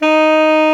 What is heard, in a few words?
ALTO SAX D#4; Greytsounds VOL-1